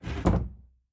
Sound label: Door Slide Close; The Inn